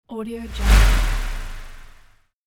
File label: fast intro transition